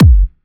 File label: 130BPM Tibet Kick